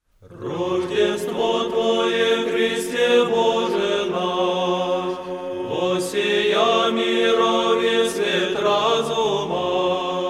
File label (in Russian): tropar rozhdestva hristova glas 4 grecheskiy raspev, khor bratii valaamskogo monastyrya; Верою и любовию